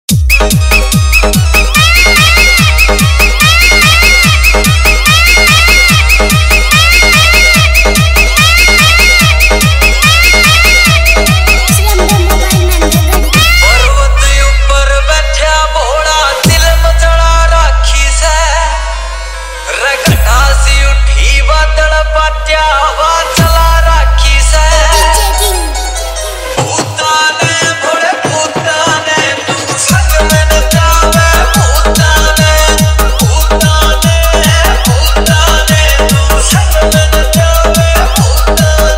Hard Bass Compitition Vibration Remix Dak Kawad Song